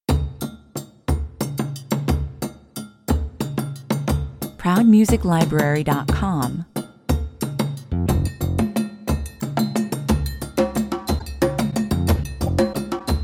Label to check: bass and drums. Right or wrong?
Right